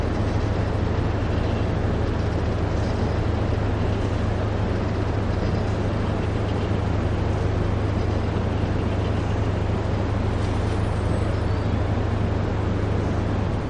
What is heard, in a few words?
external ASHP fan wall mounted recorded from 30cm underneath; ASHP-Kingston; xternal AHSP fan